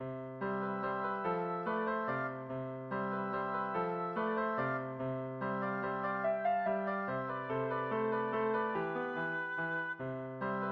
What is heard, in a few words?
lakme, oboe